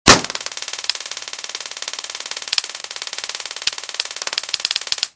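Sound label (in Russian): звук электрошокера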